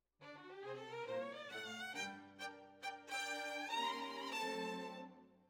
Violins, jazz for piano

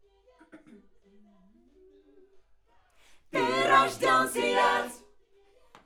Schoeps AKG, piano

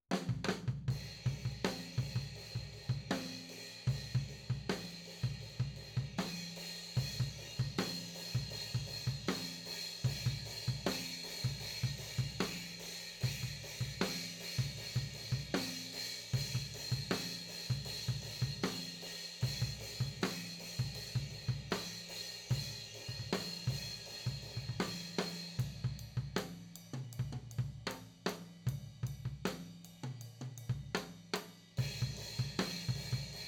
FLOOR

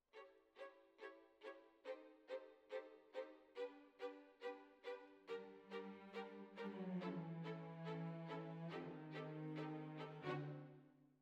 Violins Over

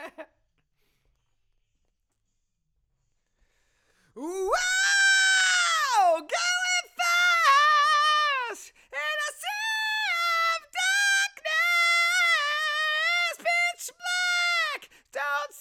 Ad Lib

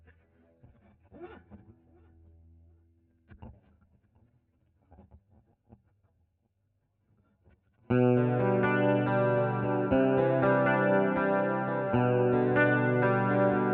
GTR Solo

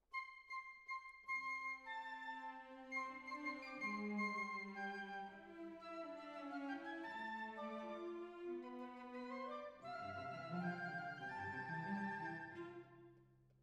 Violin Solo

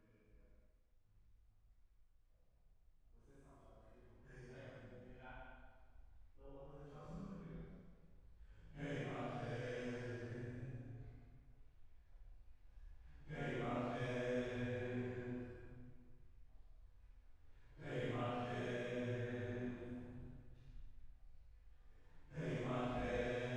Choir

